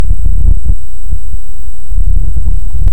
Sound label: Hydrophone; OneOcean